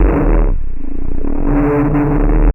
Noise; PCGamer50

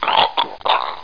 drown; Hexen2; player

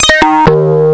Rock Organ

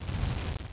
PAGE BURN

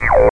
outer space, electronic sounds

